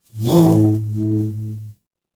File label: animal insect